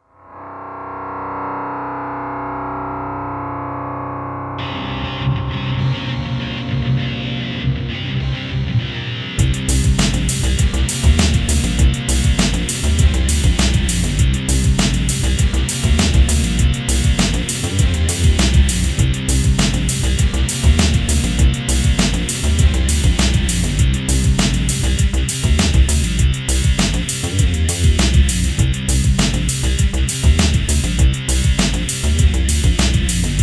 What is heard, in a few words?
fat guitar